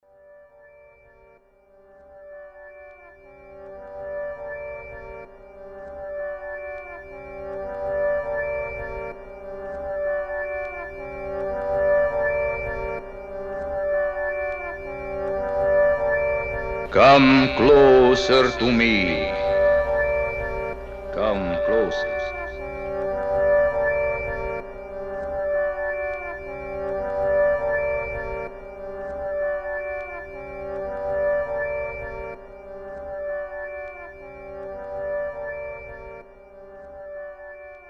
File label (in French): interlude en mi mineur